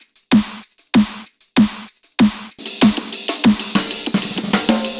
PERC LOOP

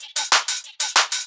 Top Loop

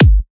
Kick A

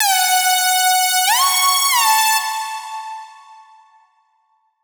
Synth Lead

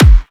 Kick F

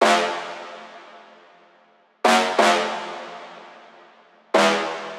Tek Horns

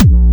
Kick Bass Subs A

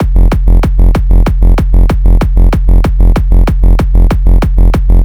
KICK BASS F LOOP

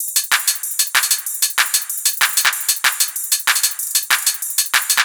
Hats N Claps

